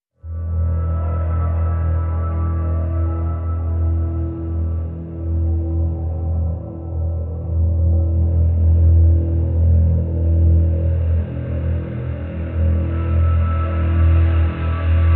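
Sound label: Drn E, drone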